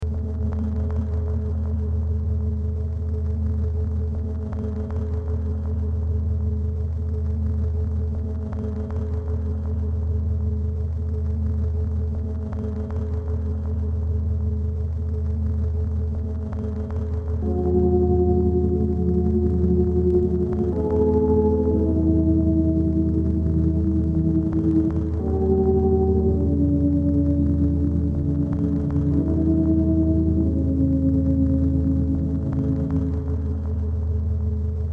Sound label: Korg Z1 Loops